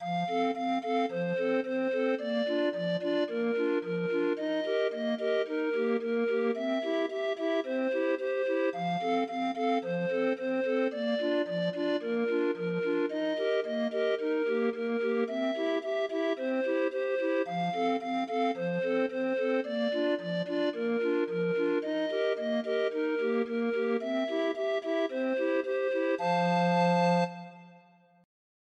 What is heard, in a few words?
Grind Organ, 20 note MIDI file